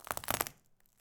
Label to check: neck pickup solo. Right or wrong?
Wrong